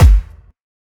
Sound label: long room reverb kick single hit g key